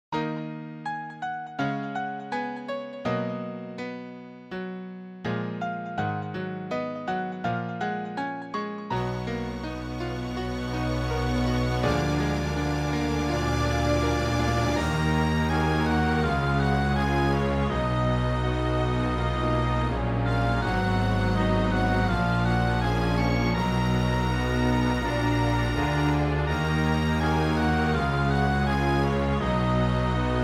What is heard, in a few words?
MIDI Theme; Secret of Magia